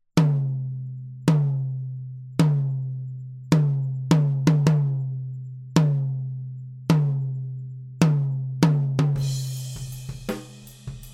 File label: Tom Microphone Horizon